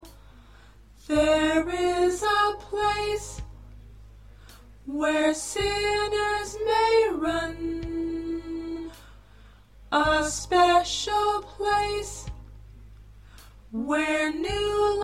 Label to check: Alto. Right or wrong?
Right